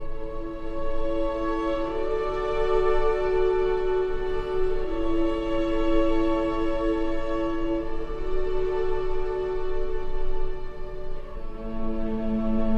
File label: for cello and strings; Archi di Santa Cecilia